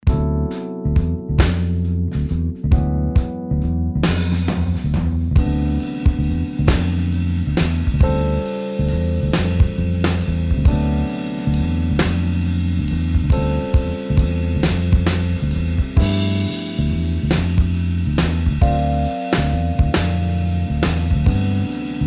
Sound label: improvising backing